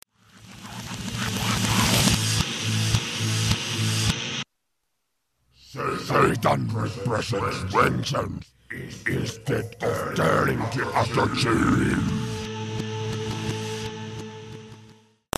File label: reverse; backmasking contrario